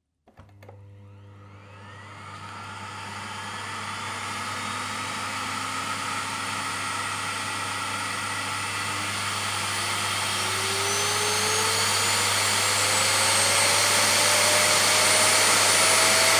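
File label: vacuum cleaner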